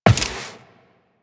footsteps walk; Warframe; Tenno

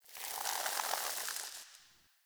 Foliage Growth D; Powers; Fey Eldveil; Eldveil Stillness

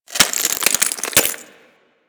Ocean Glass Crack Small; Ambience; Warframe; Grineer Ocean

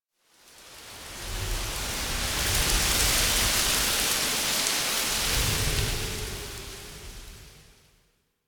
Tower Wind Texture; Warframe; Quests; TheNewWar; Ambience; Cetus; Unum Tower

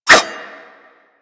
Dagger Whoosh; Warframe; Weapons; Dual Daggers

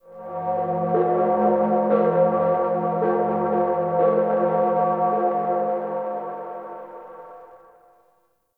Bell Texture, Crypt Ambience; One Offs; Champion Crypt

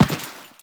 Step Default, Footsteps; Warframe; Teshin

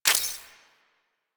Railjack UI Mod Install C; DE; Warframe; Railjack Player Ship

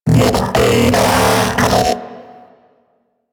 Mech Ambient Vocal

